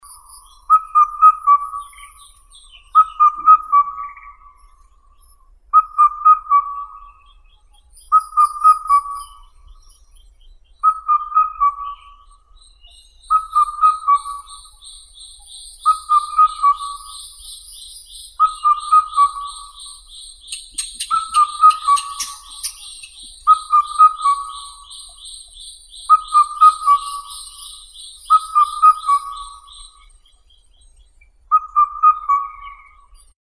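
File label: indian cuckoo, cuculus micropterus; kukushka indiiskaya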